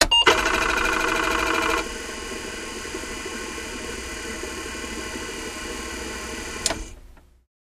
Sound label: Computer Switch Beep; beeps & tones